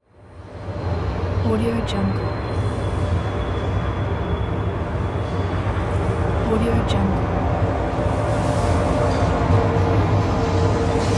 train station; wistansound

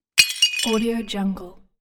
broken plates

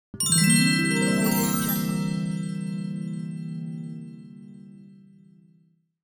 magic zing

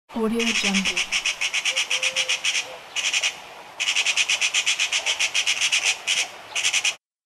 screeching magpie

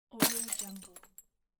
breaking glass

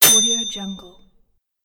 cooking bell

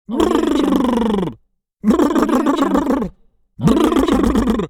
funny lips

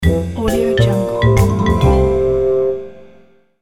baby toddler win logo

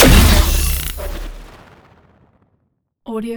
utilities flares

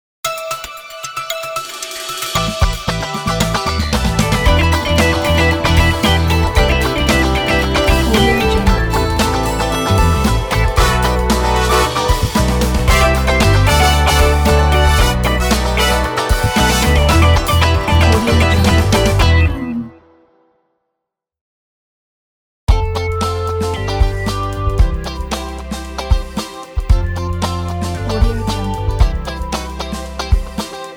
cooking soul

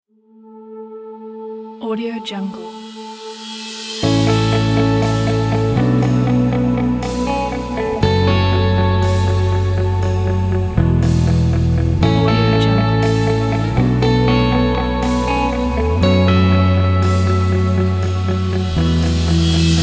motivational inspiring pack

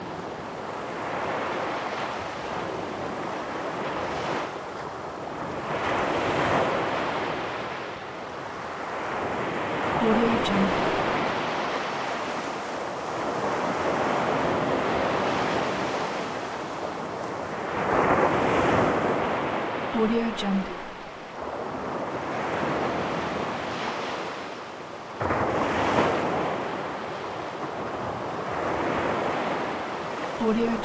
water sea waves choppy italy grado

